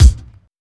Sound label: small reverb bass drum one shot c key; tuned drums